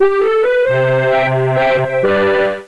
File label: accord; robocop